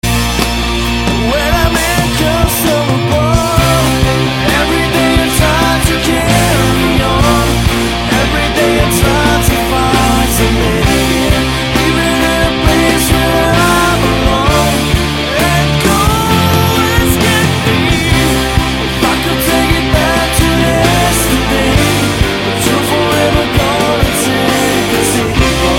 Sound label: Toma Ringtone